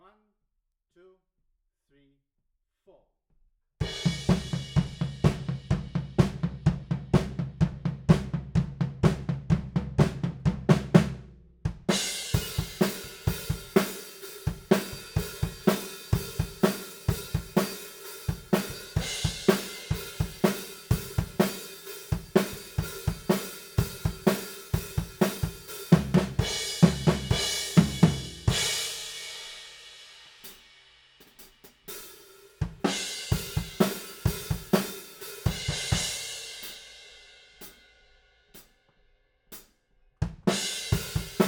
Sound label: Room